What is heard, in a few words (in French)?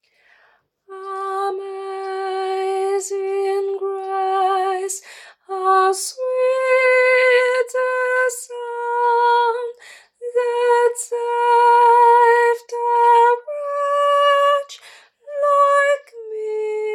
Amazing Grace tenor